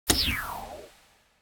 Menu Button Confirm

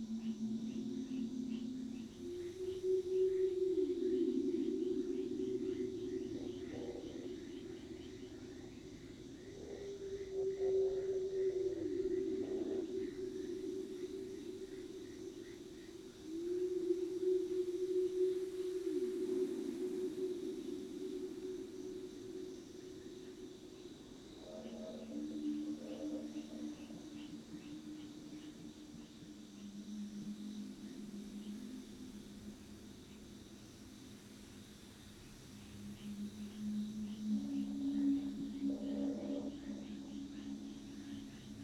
Ambience Tropical; u3d guided alien shop

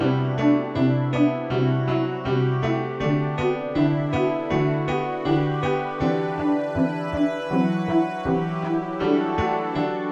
Background Music; Udemy Card Battler

